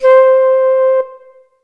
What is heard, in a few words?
Alesis S4 Plus Piccolo C5